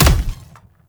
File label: Spore Pistol Punch; Weapons